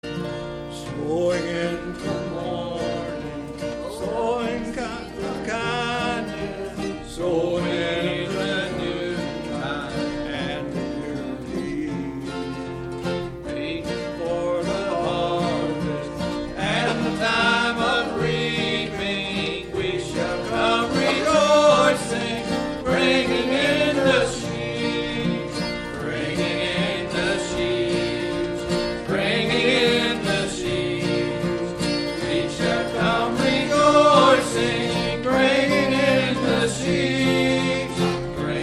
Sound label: Choir; Bringing In The Sheaves